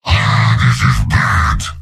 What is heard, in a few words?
heavy negative vocalization; mvm